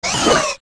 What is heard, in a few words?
Hit; Baby Dodongo; zelda; OOT